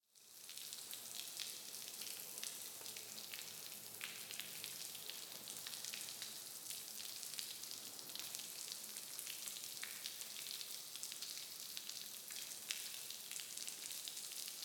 Water Spray Drops Streaming Against Cement Water Hose With Spread Delay; Hollywood Edge; sound designer's toolkit v2